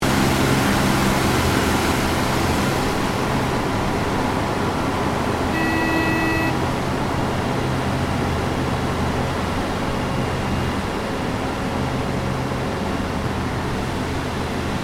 AIRCRAFT CAC CA-18 MUSTANG MK23 APPROACH LANDING INTERIOR; CA-18 /P-51 Mustang; Airplanes; Vehicles; Aircraft Collection; Twistedtracks